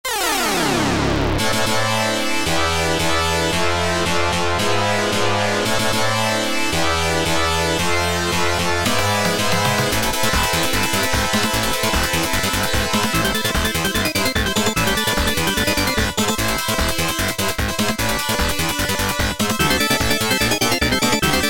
arcade start demo; tiger road

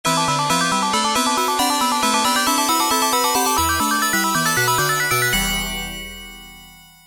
stage clear; tiger road

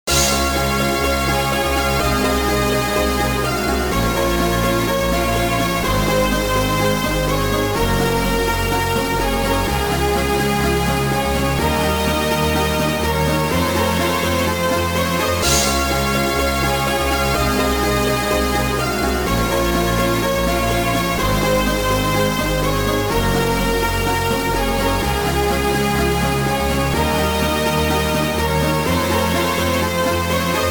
game complete

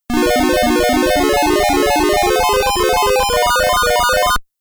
magic hat

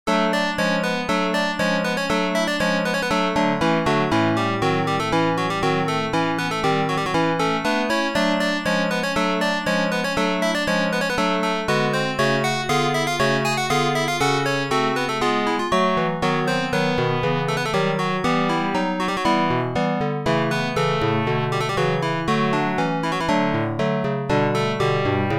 warning

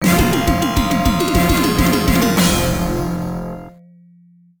arcade stage clear; vendetta

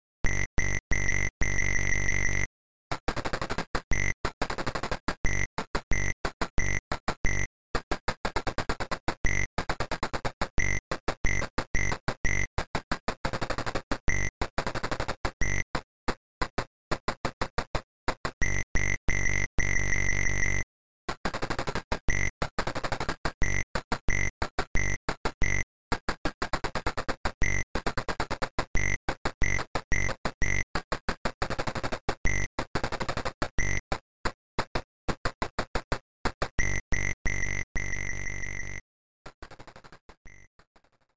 main bgm

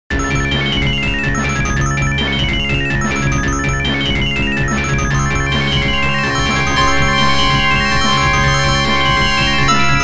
the water floor water stage

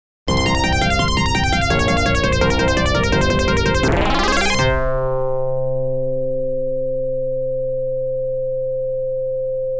game start

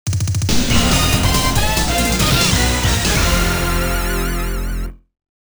out of luck game over

